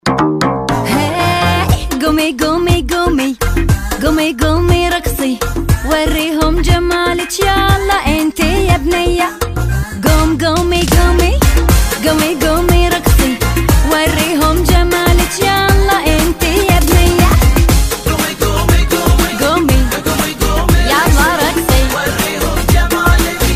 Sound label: Mobile ringtone